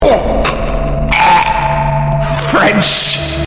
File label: ugh argh french